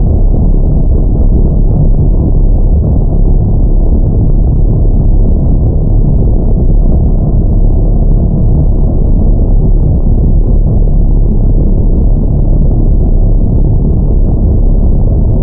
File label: Exit Drone; Warframe; Ambience; Generic Amb